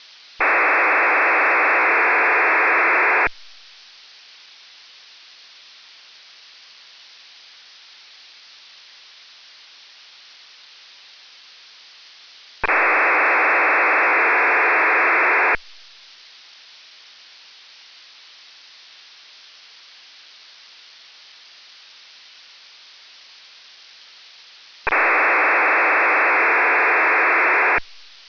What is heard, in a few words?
RFSM 2000 Bd; RFSM 2000 with 500bps and long interleaver; MODIFIED MIL-STD 188-110A SERIAL TONE WAVEFORM